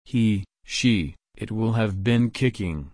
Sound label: kick